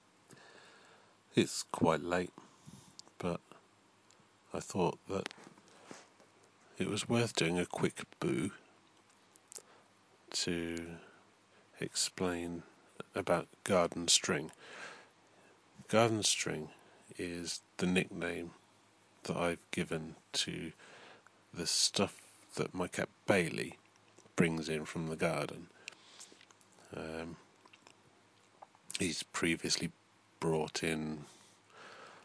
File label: garden string